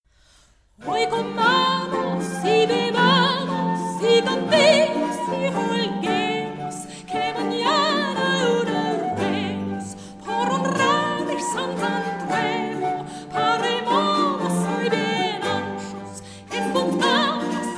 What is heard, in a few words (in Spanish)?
Villancico, Renacimiento; Juan del Encina; Oy comamos y bebamos; HISTORIA DE LA MUSICA